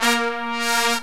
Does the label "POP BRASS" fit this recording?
Yes